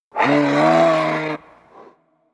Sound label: Moose Vocal; Animals; XBOX; BassProShopsTrophyHunter2007